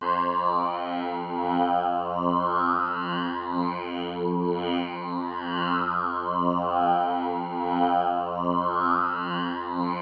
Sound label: ASR10 Formant clav